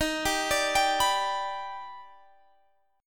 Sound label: D sharp Augmented Major 7th D sharp; D#+M7; arpeggiated; x,18,17,19,20,19; chord shape